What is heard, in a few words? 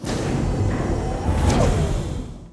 airlock close; cardassian